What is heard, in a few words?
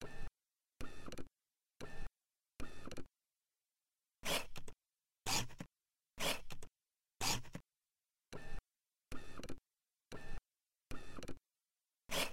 50mm STM vs II Comparison